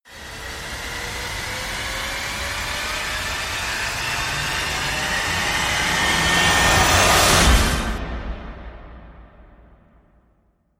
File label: rise short; whirlybird